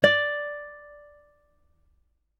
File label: dial tone guitar